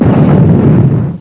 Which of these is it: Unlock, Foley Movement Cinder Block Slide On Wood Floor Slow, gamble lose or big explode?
big explode